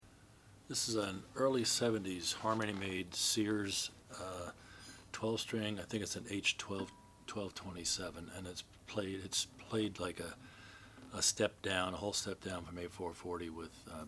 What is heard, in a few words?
harmony sears natural